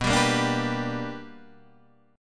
B Minor Major 7th Sharp 5th Guitar Half Step Down

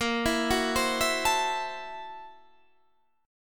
Gb 7th Sharp 9th Flat 5th Bb, guitar

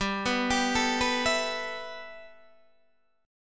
G 6th Add 9th G Guitar DADGAD, chord

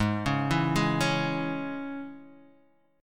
G sharp Suspended 4th Sharp 5th; arpeggiated; x,x,6,6,4,3,3,x

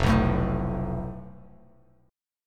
F Major Flat 5th, Bass Standard; FMb5 strummed; JGuitar

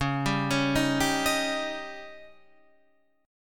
C sharp Suspended 2nd Flat 5th C sharp

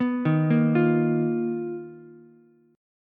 B Major Sharp 11th, Ukulele, C6; BM#11; arpeggiated; JGuitar

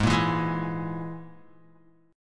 Ab Suspended 2nd Sharp 5th, Guitar; strummed; 4F,4A,5C,5G,5C,6E; 3,1,4,1,4,x; JGuitar

